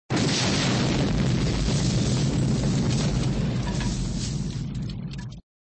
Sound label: Sound Explosion Crash; flipper